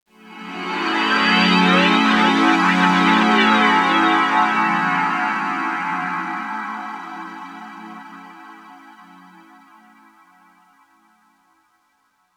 Noize FM Pad, Underground UK House & Electro, Pads; Sonic Foundry (Sony Creative Software)